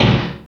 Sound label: kick metal, dance kicks; Roland L-CDX-01